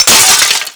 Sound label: shatter; WhiteOut